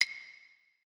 reels stop; Butterfly Lovers; wazdan

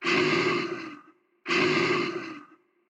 dracula wild; DraculasCastleWD; wazdan

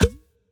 stop; DoubleTigersWD; wazdan

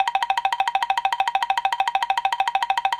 gambling draw; Colin The Cat; wazdan

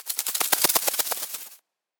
butterfly wings; Butterfly Lovers; wazdan

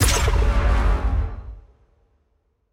gambling win; Caribbean Beach Poker; wazdan; ultrafast

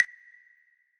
mouse default; Butterfly Lovers; wazdan

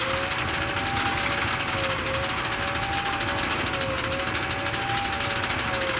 spin loop; BARs 7s; wazdan

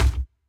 reels stop; Burning Reels; wazdan

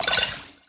collect; BARs 7s; wazdan

